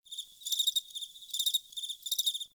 Forest Open Glade Cricket Close, Day; Ambience

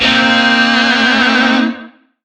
Shawzin Blues Chord; Warframe; Lizzie Shawzin

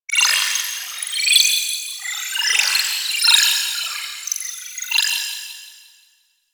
Droid Array One Off; Warframe; Cephalon

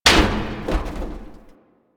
Military Checkpoint Wall Destroy; Warframe; Ambience; Nineteen Ninety Nine

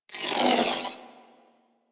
Ball Held Spark; Warframe; Missions; Tenno Ball

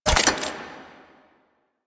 Shotgun Mech; Weapons; DE; Warframe; Tenno; Pod Shotgun

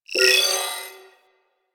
Crp Ship Rolo Dex Deselect; Ambience; Props; Warframe; Corpus Ship Remaster

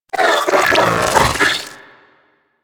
Amalgam Moa Satyr Vocal Idle; Warframe

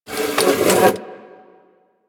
Classroom Chair Drag; Ambience; Warframe; The New War; Quests; Zariman

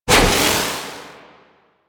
Operator Wraith Void Projection Cast; Warframe; Focus; Powers; Wraith Movement

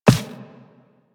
Walk Default, Footsteps; Warframe; Operator

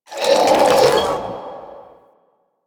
Embrace Shockwave Cast; Warframe; Broken Frame

